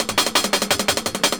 Hat 170, Perc Loops, Drum&Bass; EZRollers

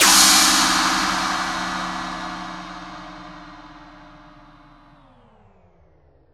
Crash, impact samples

